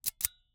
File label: Icecream Scoop, metal, mechanical, teeth, gear, squeeze, release; Eiravaein Works; Vaeyan IV